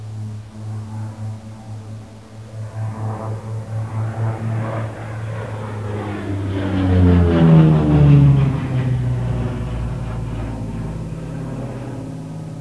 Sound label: flyby, p38